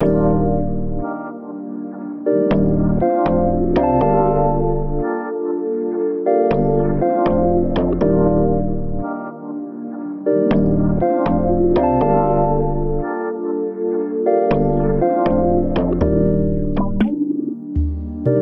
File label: 120 BPM G Min, Vintage & RnB Melodies; Blue Eyes; Cymatics